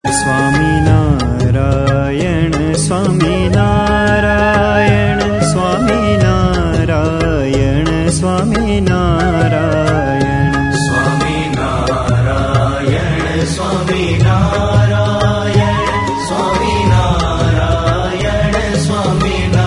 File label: Dhun; Swaminarayan